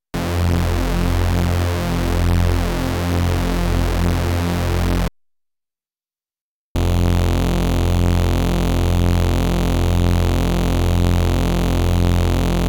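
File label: Saws Dry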